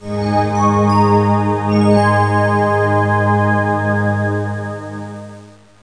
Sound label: sting